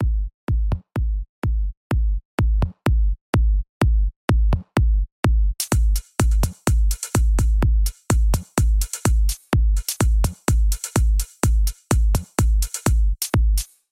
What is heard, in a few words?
Kick Pump Wheel; Eden